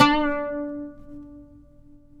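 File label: pluck OUD; Roland - LA Composer vol. 3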